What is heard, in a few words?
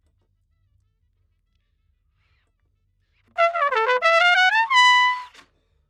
Trumpet